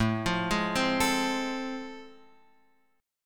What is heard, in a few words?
A Minor Major 7th Sharp 5th, Guitar Open F; arpeggiated; AmM7#5; JGuitar